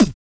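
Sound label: vox percussion, Vocal Planet Jazz FX; Spectrasonics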